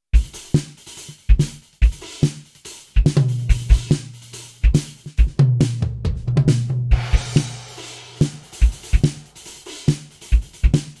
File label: Fatboy